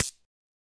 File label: hit shock light; CrossCode; battle